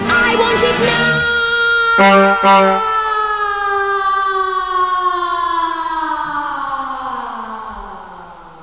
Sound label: wonka fall